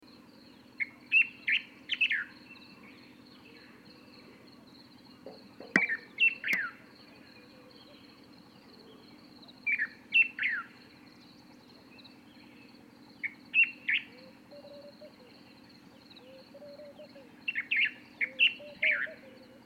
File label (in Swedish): levantbulbyl